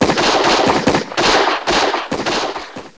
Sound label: catapult; CIVILWAR